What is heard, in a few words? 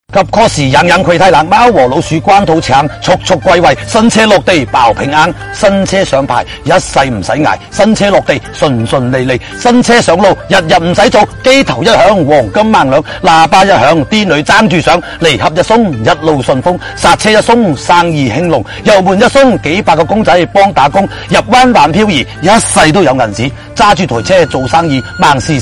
laugh; breadradio